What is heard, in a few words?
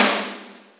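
huge snare, Boss DR660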